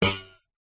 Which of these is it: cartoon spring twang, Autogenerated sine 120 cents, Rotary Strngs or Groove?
cartoon spring twang